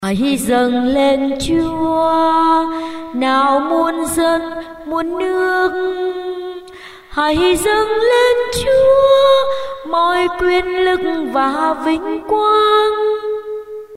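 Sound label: soprano tenor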